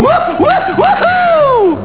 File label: wouhou